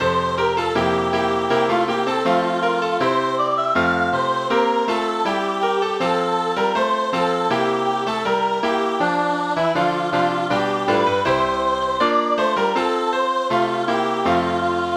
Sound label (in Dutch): Herderslied